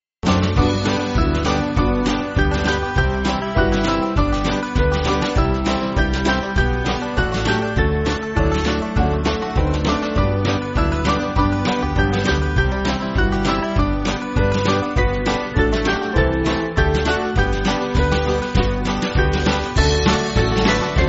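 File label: Band, Backing Files for Churches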